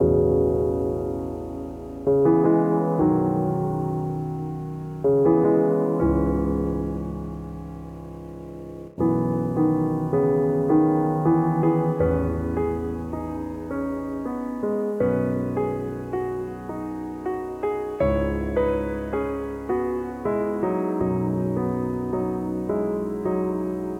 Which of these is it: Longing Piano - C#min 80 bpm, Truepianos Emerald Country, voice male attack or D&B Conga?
Longing Piano - C#min 80 bpm